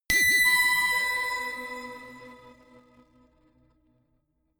notification glockenspiel crazy single; instrumente

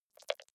click erratic wide

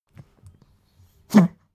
pug smack gag; natur-tiere; hunde

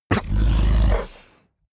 fishman snort exhale; kreaturen

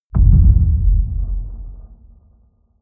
boom low impact; hits explosionen

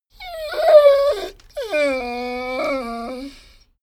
dogo argentino whine sequence; natur-tiere; hunde

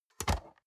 storage lid slide close medium, box; objekte

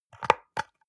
soap dispenser press almost empty; haus-garten; badezimmer

